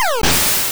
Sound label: Pkmn faint; Pokemon Essentials; PKMN Estelar 1.3